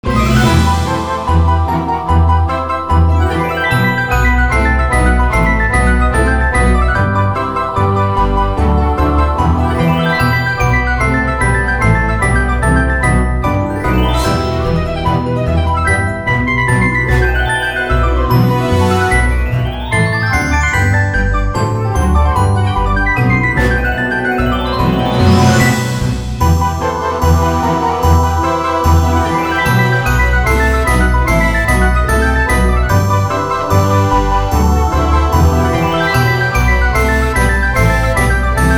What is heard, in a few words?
free spin tune; Cash Fairies